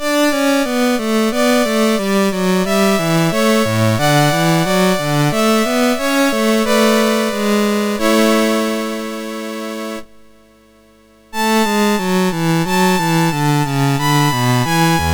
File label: Trio Sonata, MOS6581R2; Op3 No2